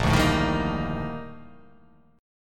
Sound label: A sharp Major 13th A sharp